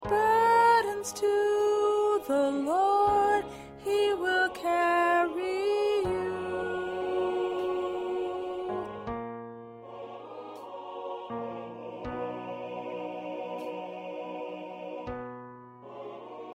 Lead Soprano

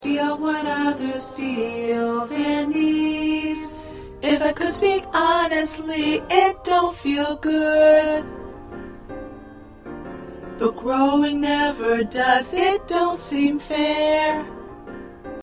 Tenor, choir

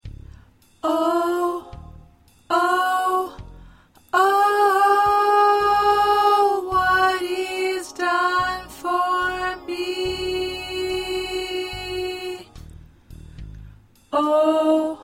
1st Alto

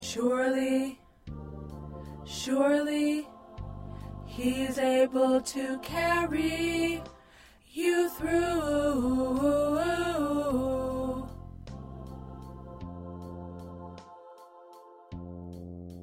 Alto

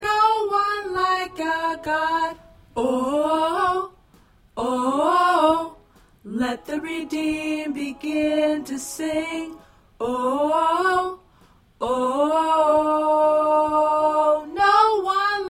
Tenor; gospel songs; Tenor part

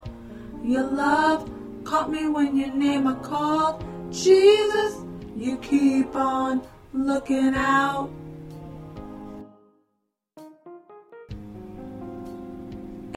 Alto

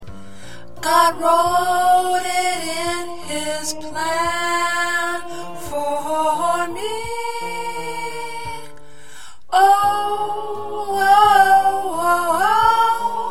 Alto, choir parts

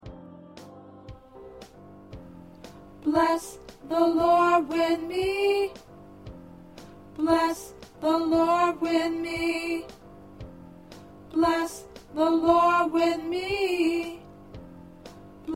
Alto

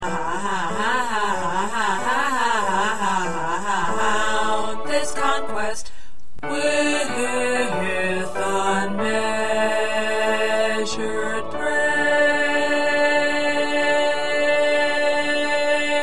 1st Bass

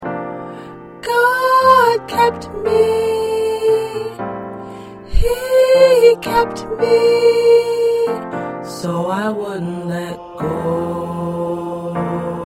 Soprano, choir parts; PRACTICE TRACKS

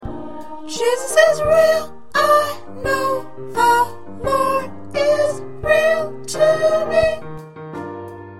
Soprano

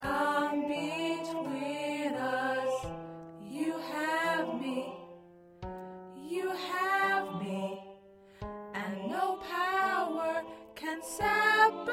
Soprano, choir parts

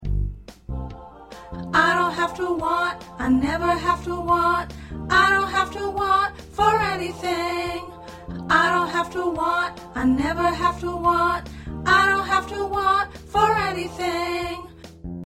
Alto, choir parts

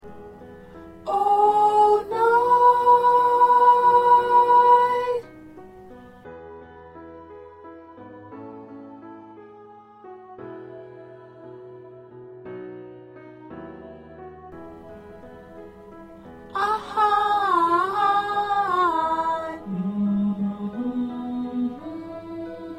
Soprano, choir parts; practice tracks

